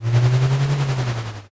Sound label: growl